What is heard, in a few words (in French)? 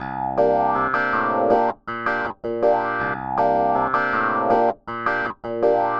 basse triades, funk piano; Triades b795; Second Line 2 + 3